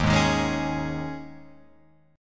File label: D sharp 7th Suspended 4th, Guitar, Open F; D#7sus4 strummed; JGuitar